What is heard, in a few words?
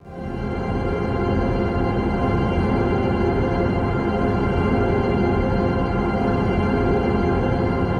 The End Pad, Synth; The Forge by Hecq; Ableton; Factory Packs